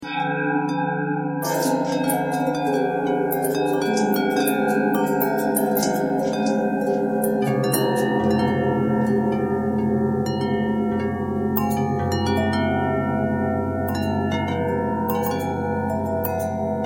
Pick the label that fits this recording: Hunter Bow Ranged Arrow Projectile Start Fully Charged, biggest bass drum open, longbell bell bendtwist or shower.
longbell bell bendtwist